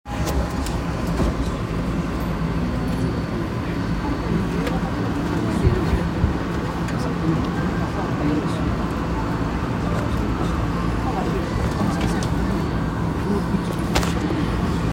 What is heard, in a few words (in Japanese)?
銀座線虎ノ門東, train sounds